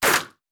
Bullet Impact; Sound Ideas; Guns

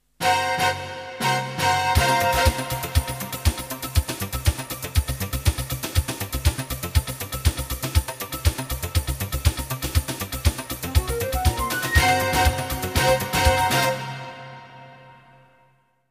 jingles; 90 DDD Jingles

